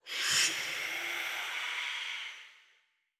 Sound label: Cry